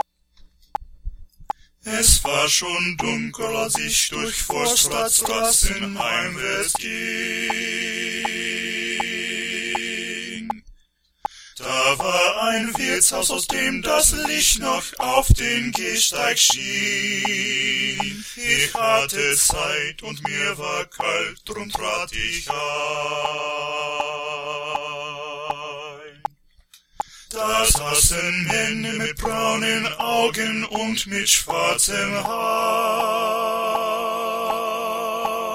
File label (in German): Tutti